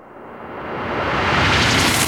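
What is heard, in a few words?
FX right, attacks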